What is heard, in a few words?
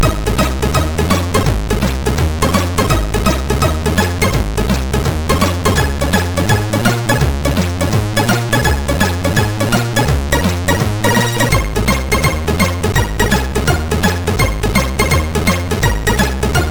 Happy Musiz, sid MOS6581R3